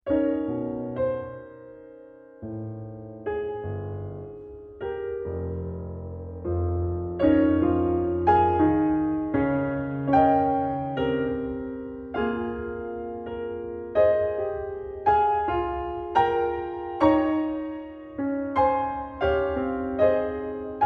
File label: piano sonata attacca